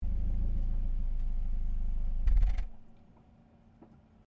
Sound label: Car Ford Focus Shutdown Exhaust; Ford Focus hire car; Cars; Vehicles